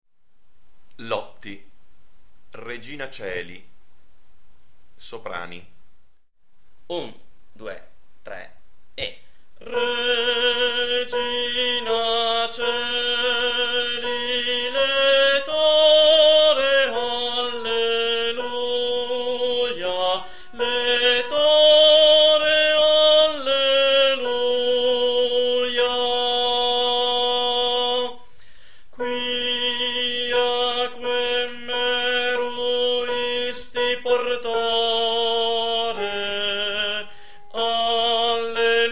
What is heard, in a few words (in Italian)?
Soprani; Regina Coeli